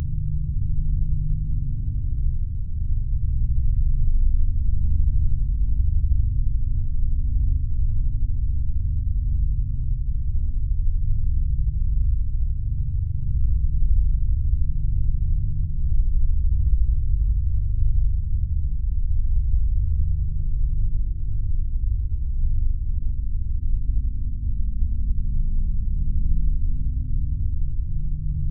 Bass Drone

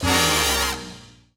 Combo Oldman Hit; Bee Movie Game; Restaurant

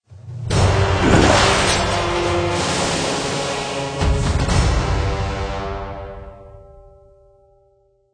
lose jingle

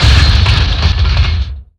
Fence

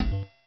Kart Attacked; pac man kart rally java

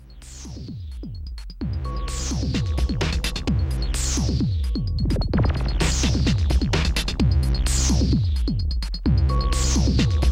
menu song

